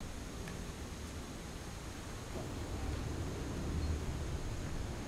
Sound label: city loop